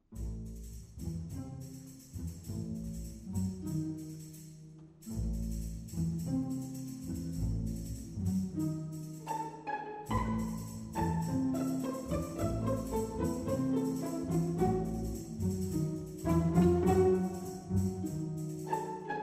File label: Tango